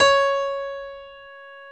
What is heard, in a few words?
Piano C#4; Proteus 2000; Digital Sound Factory Proteus 2000